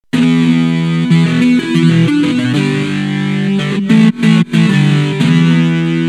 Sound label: Kawai s5000